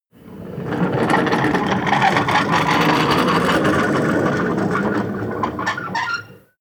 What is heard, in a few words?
slide wooden door squeak